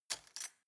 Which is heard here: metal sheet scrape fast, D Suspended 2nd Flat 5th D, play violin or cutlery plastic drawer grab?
cutlery plastic drawer grab